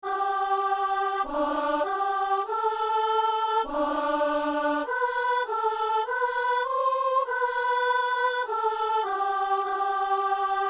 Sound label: soprano; Peuple fidèle; Messe du 17 janvier à Saint-Denis